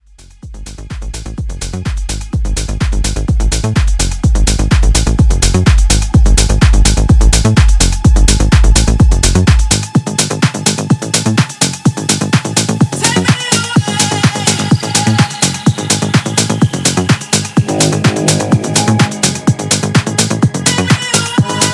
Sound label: Tech House Intro; Remixfreakz